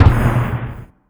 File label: jumppad